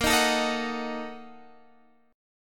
Bb Major 7th Suspended 4th Sharp 5th, Guitar, Drop D; strummed; 20,18,19,20,19,x; BbM7sus4#5